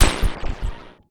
uiglitch impact glitchgrains; roguewaves; Sonniss GDC

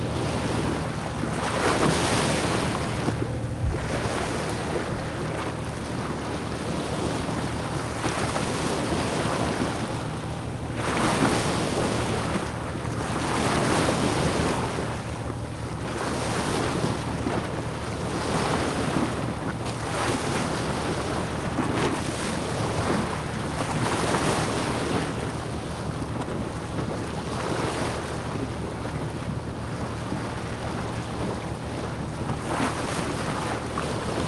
water splashing sailing small fishing ship; fvtt sonniss gdc audio

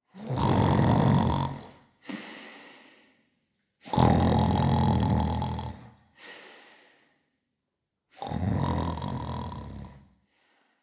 snoring humanoid; creature sleeping

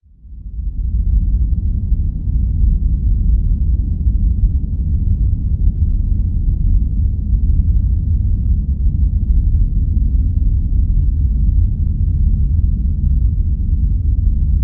spaces deep space rumble; fvtt sonniss gdc audio

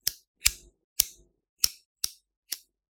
lighter; fvtt sonniss gdc audio

